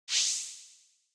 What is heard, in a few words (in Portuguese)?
Pulo Ar; super mombo quest